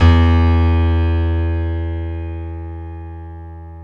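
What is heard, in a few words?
dance piano E1; Best Service Advanced Media Trax Modern Composer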